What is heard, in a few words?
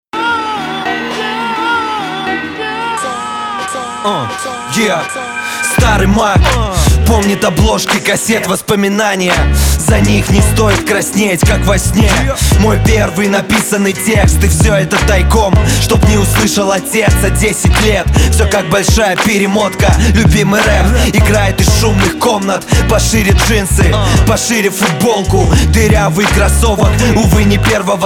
rap